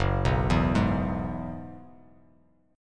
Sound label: F sharp 9th Suspended 4th F sharp, chord; arpeggiated; JGuitar